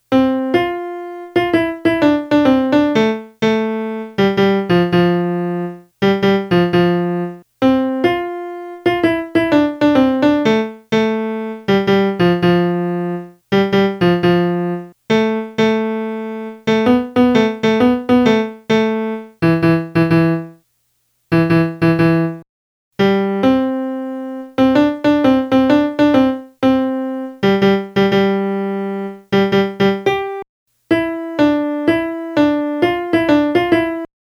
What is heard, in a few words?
funiculi funicula melody; southern songs